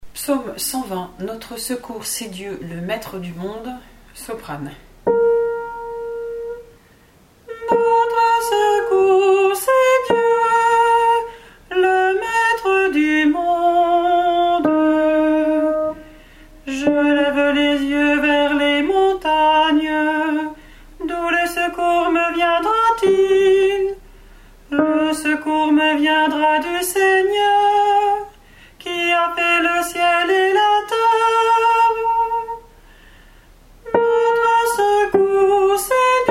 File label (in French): Psaume SOPRANE; 120 Notre secours cest Dieu le maître du monde